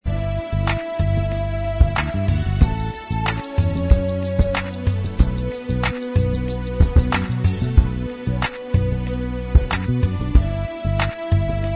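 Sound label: Inst Track, instrumental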